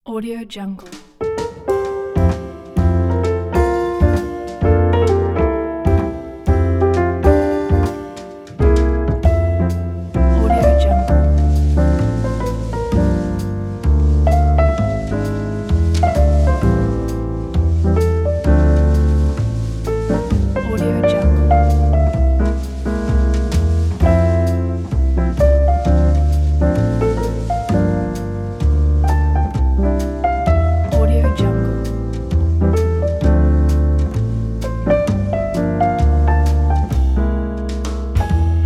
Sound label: jazz piano